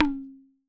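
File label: INDIAN MIRD, ETHNIC SET; Voice Crystal - Steve Reid Definitive Percussion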